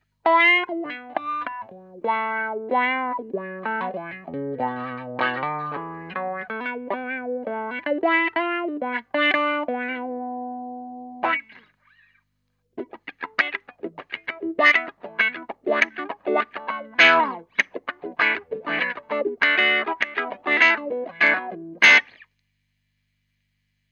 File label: Wop Wop Wah Wah